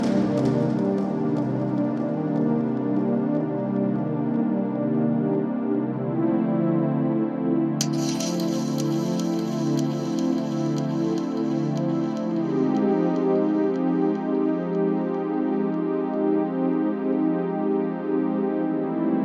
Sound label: Silent Ringtone Android